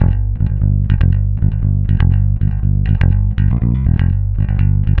throb G, 120, bass; Spectrasonics; Bass Legends

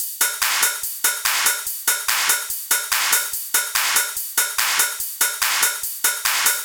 144 BPM Loop, Drums & Perc; Vengeance - Essential Clubsounds Vol.5; Flight Kit Root Amin

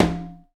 PRC XCONGA, Skin Drums

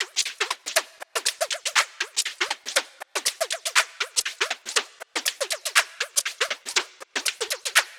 Mix Fx Loop 120; Equipped Music

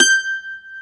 Mandolin G5; Planet Earth; Digital Sound Factory Planet Earth

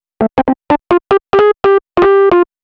Rhodes Solo, Cinematic Funk; Cinematic Funk Urban Tone Poems

